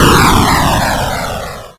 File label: Thunder Magic